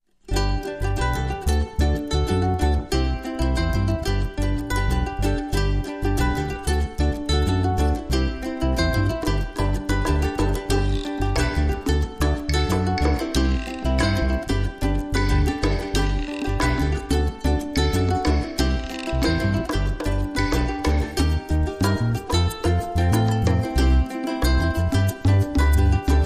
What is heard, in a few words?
Ukulele, Underscore